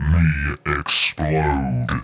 Gorilla; PC Gamer IT CD 41; machines demo

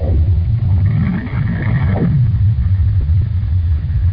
Water Thrust

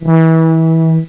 deep brass, horn instruments

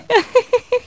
happy; My Science Fair

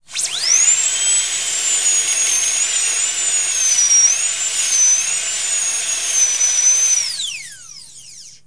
Drilling Teeth; KABOOM! - Wild and Wacky Sound Software; Human; Medical & Dental

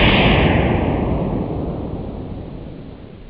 slam

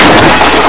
crash

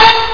blast

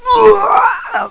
uuaaagha

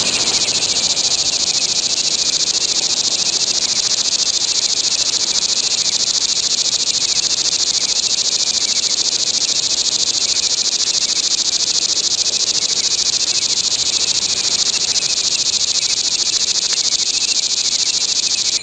Hot Field Crickets; Soundsation; Animals

